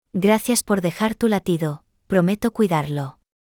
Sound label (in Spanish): latidos